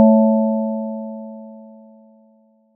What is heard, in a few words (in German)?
glocke, kirche; michel